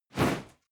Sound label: whoosh classic